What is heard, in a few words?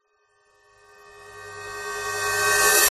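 sound design cymbal reverse crescendo suspense climax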